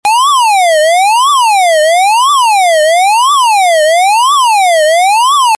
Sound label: tone